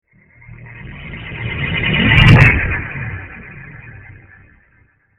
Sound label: Spaceship Pass By, Small, Ship, Science Fiction; 5.1; BlastWave